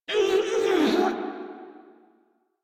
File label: Death Grineer Female, Barks, Battle; Warframe